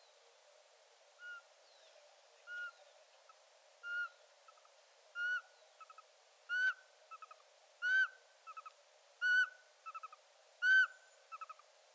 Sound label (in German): Sperlingskauz